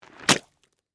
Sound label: Impact Drop Mud, Texture, Impact, Drop, Mud; Drops; Objects